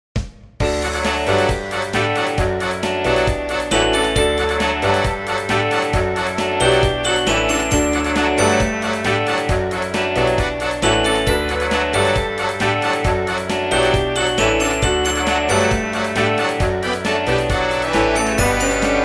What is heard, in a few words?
The Vacation Channel Jingle; Branson, MO